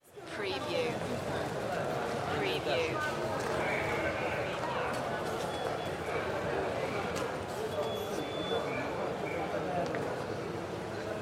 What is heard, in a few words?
Italy Train Platform; sound-effects-bible